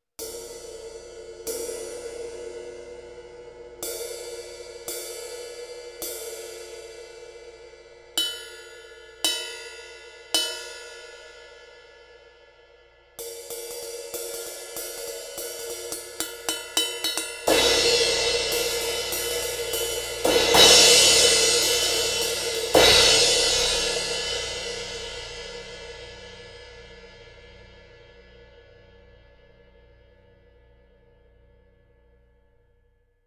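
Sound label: Crash Soundcaster Power 18, cymbales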